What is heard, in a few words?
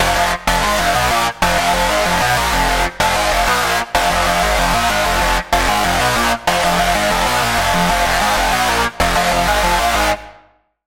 Lead, Sylenth1; Routed Sound; Sylenth1 Expansion - Doom Carpenter Vol.1